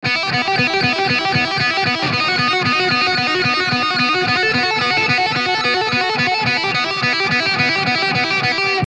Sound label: Lead